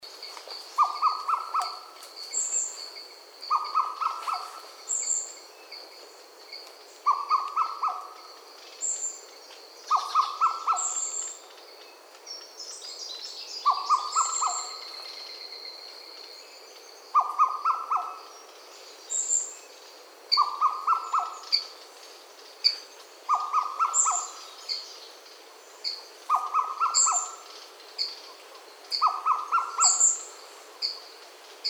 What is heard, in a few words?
madagascar cuckoo